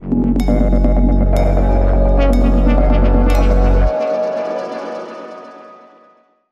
Link Sting

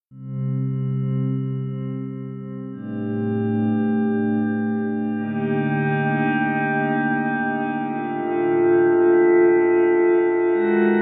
Guitar

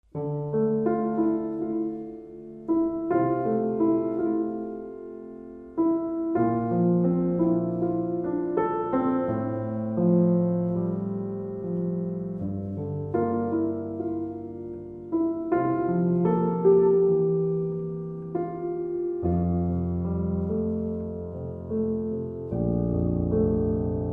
Solo Piano

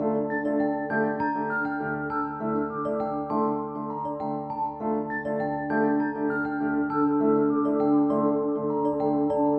Loop No Drums

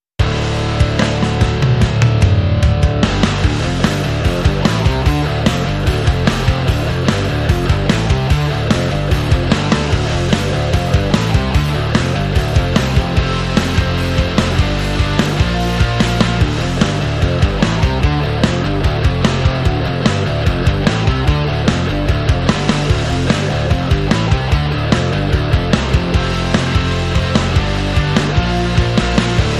No Lead Guitars

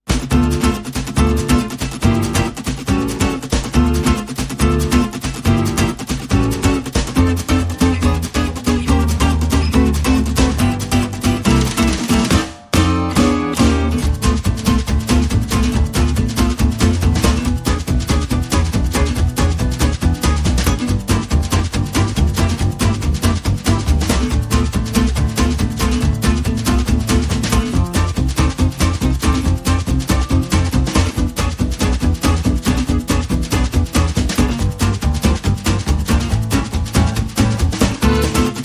Jazz Club No Crowd No Lead Guitar No Claps

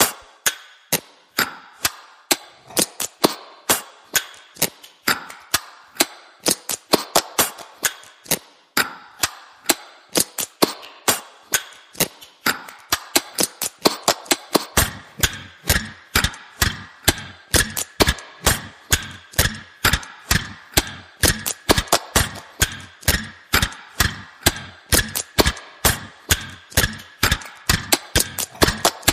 Spooky Patty Cake Clock

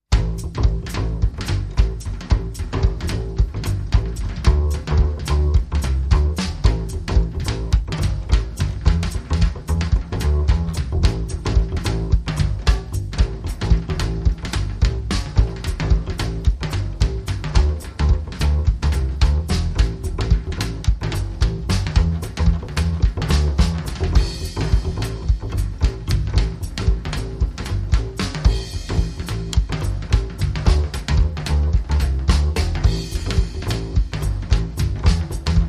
Jive Bass Go Round

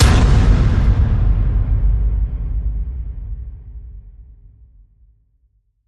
Cosmic Explosion Hit

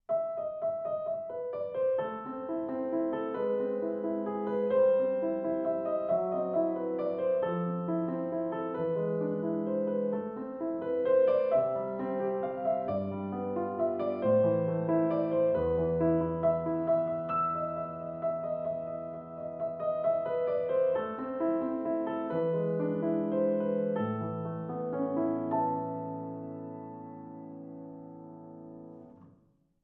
Fur Elise, Solo Piano; 30sec

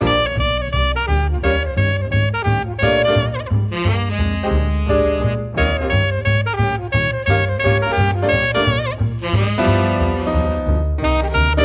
Sax, Theme No Drums

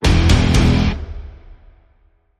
Stab Guitar Triple (E)

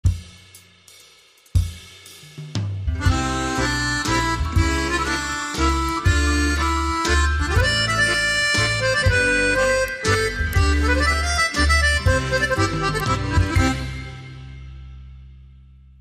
Storytellers Waltz

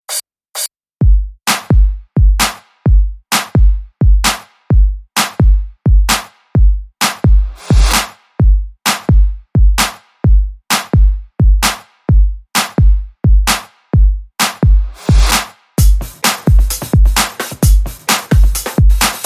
Boxed Beat

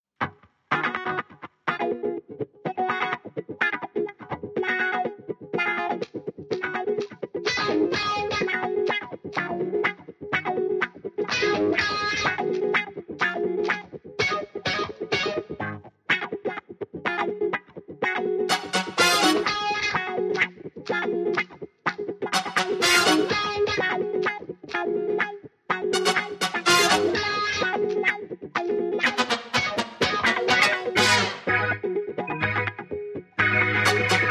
Funky Beats, Keys Guitar Brass Only

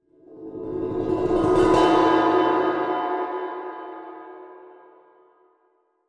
Mallet Roll

